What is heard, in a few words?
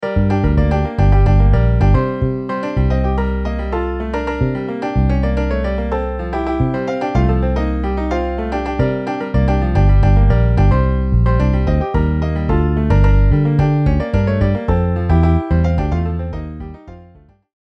Piano Bass MIDI